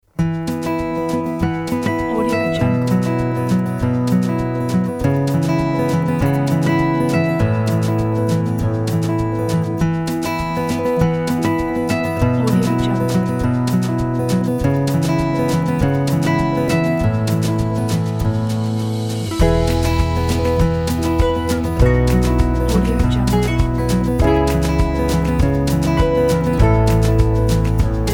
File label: mellow acoustic; گیتار آکوستیک; موسیقی بی کلام